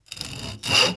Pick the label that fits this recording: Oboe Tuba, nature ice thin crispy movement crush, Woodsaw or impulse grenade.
Woodsaw